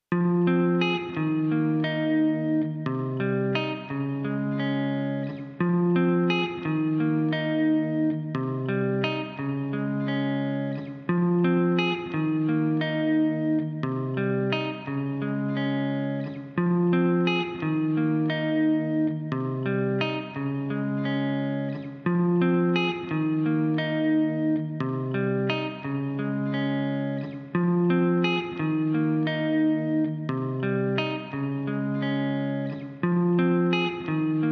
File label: Guitars